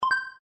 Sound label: Video Record, ui; Balmuda